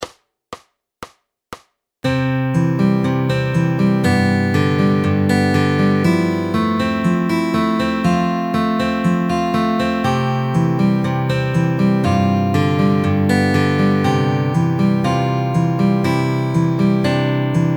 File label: Fingerstyle 3 Chord Folk Slow 120bpm